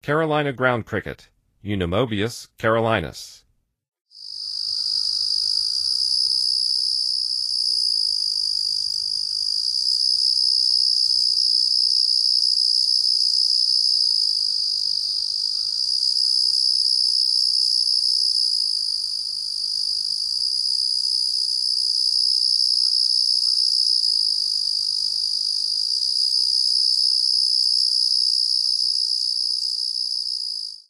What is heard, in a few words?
Carolina Ground Cricket; N. America